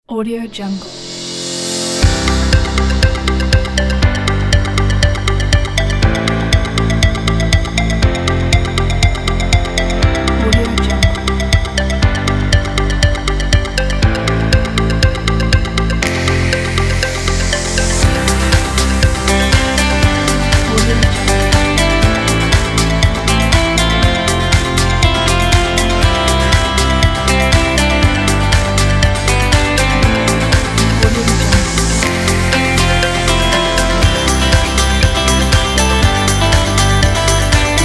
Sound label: background music